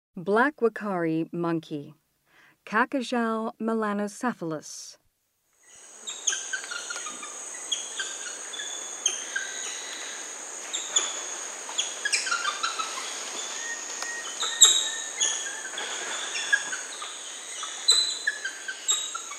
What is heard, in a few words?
Black uakari monkey; S. America; Rainforest